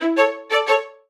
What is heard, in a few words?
Staccato, notifications; Honor Magic 7 RSR Porsche Design